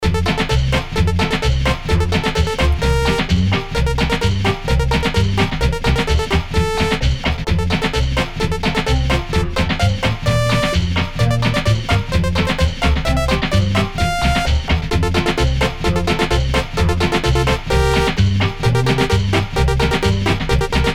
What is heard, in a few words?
Robot Polka whimsy